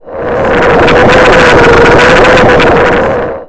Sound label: Kraken Surfacing